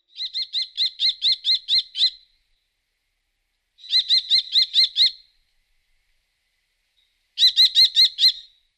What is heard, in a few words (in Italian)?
Gheppio Falco tinnunculus; Versi Animali; Il Forum della Fotografia Naturalistica